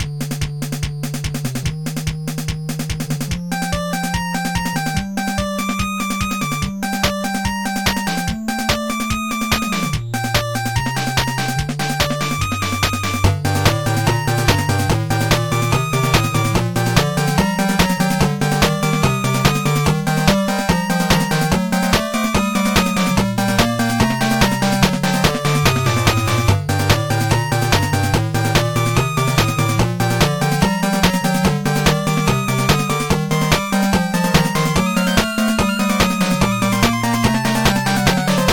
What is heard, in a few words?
Dungeon; ninja senki dx; macos ps vita ps4 windows